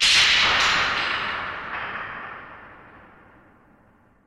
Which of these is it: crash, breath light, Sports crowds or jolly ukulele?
crash